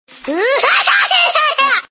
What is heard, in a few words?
lambo